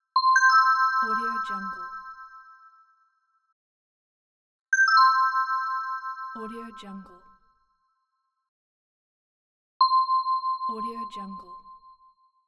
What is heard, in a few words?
ding